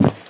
Footstep wood

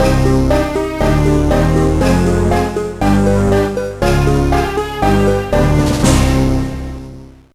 Game Over; santa claus junior gba gamerip 2002

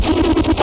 BLiNKiNG INTENSE

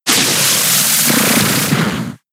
Gear Grind; attack moves; Pokemon; gen 6; XY; ORAS; Gear Grind part 1

